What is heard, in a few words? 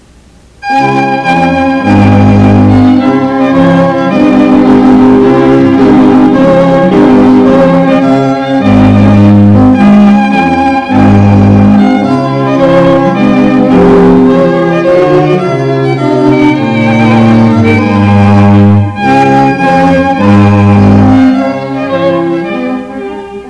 Trumpet Tune